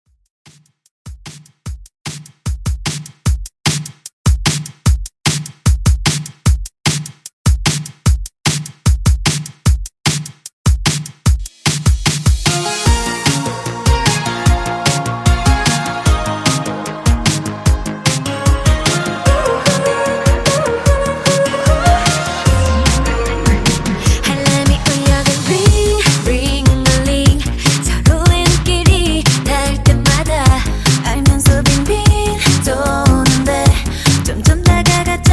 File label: Top40 Redrum 150 Bpm; English Version